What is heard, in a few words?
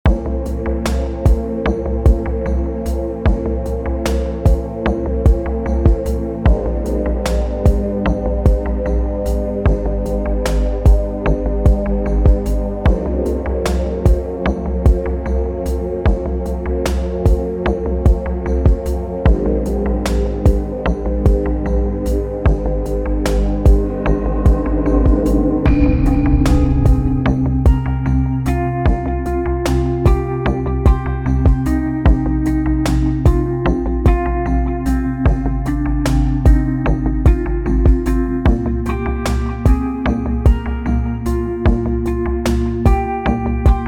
winter snow happy swing music; آهنگ های لایت بیکلام مخصوص آسانسور